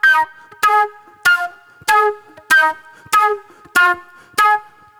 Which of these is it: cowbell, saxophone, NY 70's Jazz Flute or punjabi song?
NY 70's Jazz Flute